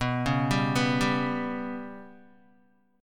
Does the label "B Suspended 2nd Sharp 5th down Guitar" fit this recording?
Yes